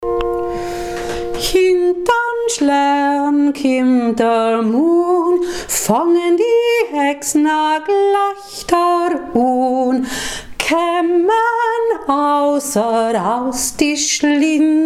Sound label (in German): ohne git